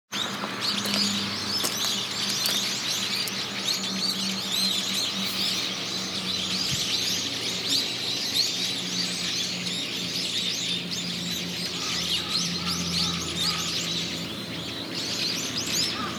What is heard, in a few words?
sparrow